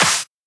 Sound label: EDM Future Clap